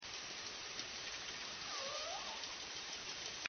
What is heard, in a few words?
transient call